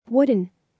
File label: wooden